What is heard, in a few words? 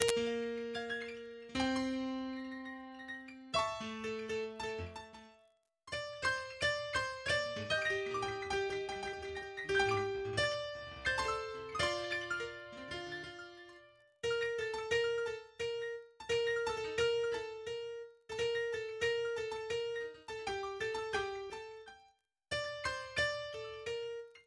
Next Stop the Stars Violin